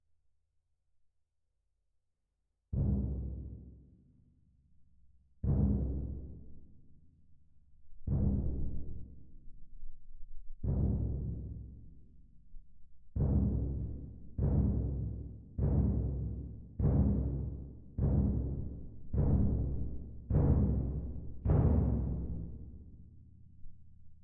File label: Twin R Perc